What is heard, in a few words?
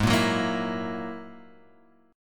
Ab 7th Suspended 2nd Sharp 5th down Guitar Open G